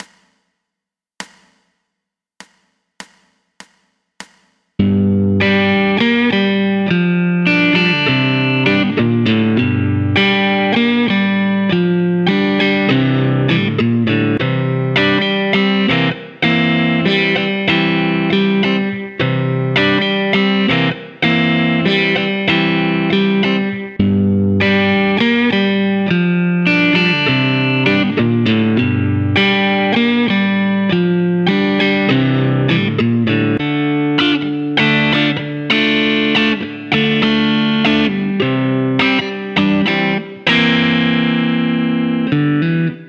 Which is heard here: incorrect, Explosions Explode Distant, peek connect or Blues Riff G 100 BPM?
Blues Riff G 100 BPM